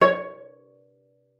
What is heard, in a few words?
cello pizz C#5; 90 s Sample CDs; Xsample VOL-5